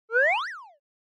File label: Motion Pop